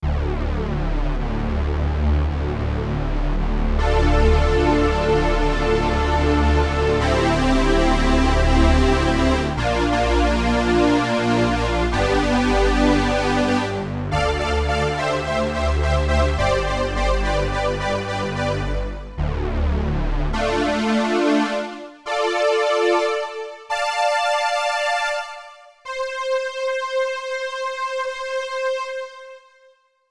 Analog Strings, Yamaha TX16W

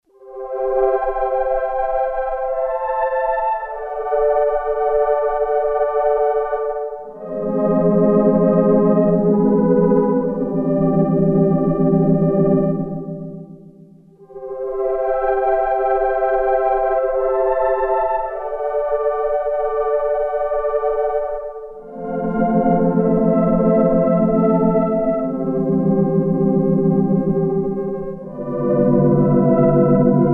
Whisper A, Yamaha TX802; Factory Patches; Voices

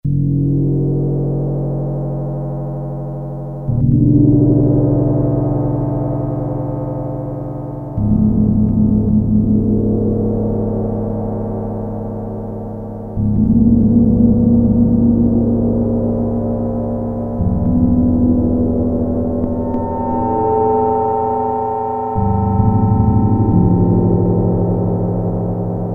GONG, Roland Jupiter-8, Factory patches

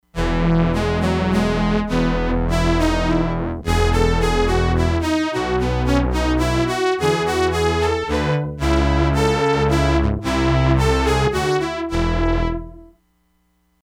BOWED CELLOS, Crumar Bit 99; Factory Programs

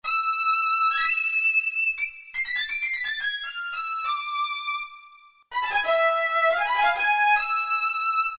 Solo Violin; Roland PN-D50-01

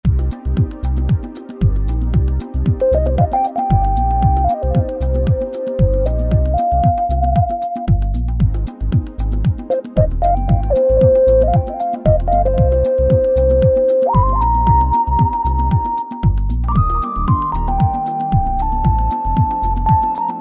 Tribal Tune, synth

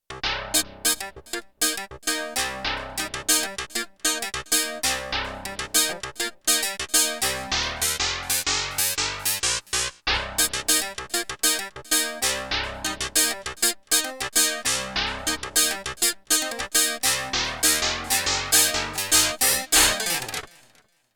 Super Clav, Roland D-70; Factory Performances

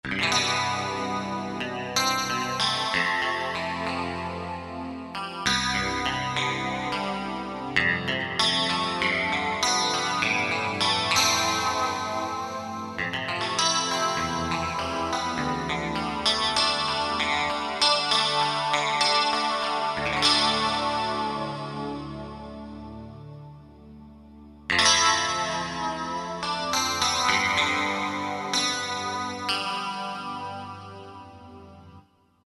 FX Strat, Roland JD-990; Factory Patches; FX Strat (add C)

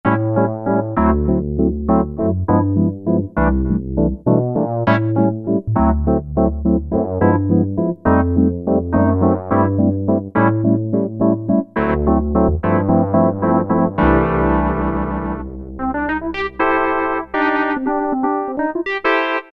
Wurly Piano, Roland JP-8000; Factory Patches

